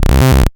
Basses Root C

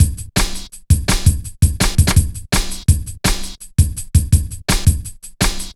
Drum Loops, Jungle; Zero-G Jungle Warfare 1, 2 & 3; Cha 166.5

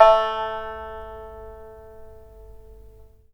SAN XUAN A4, Heart of Asia; Spectrasonics

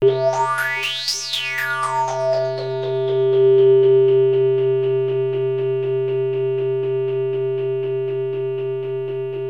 Access Virus B; Synthworx

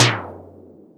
Percussions

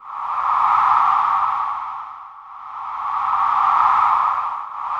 ARCTIC WIND; Distored Reality

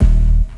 LOFI HIT, Synth FX Misc

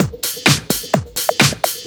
Straight Loop 128 BPM, House

